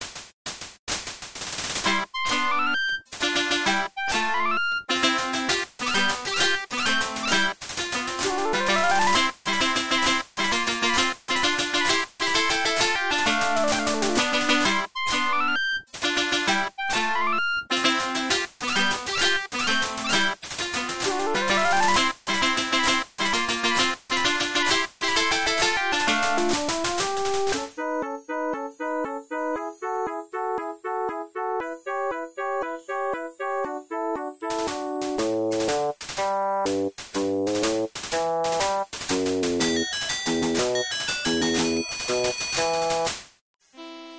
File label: Staff Roll; Quack Shot; Genesis